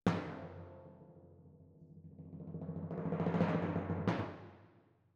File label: Timp Close